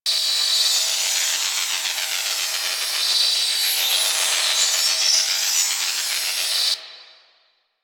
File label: Holo Globe Loop; Ambience; Props; Warframe; The New War; Zariman; Quests